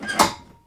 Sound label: Door Garage Door Handle